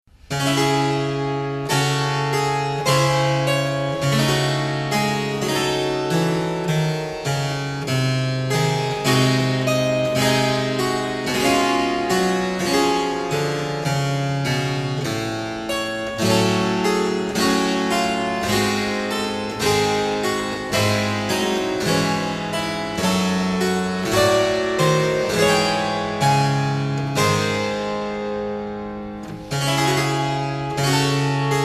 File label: Bach suite francaise sarabande; Bach Suite francaise 1